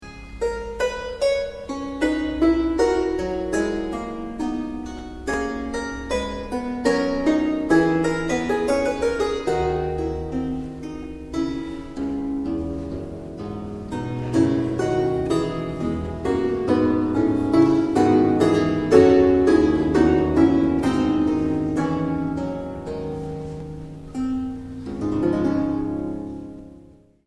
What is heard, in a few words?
tripel, historical harps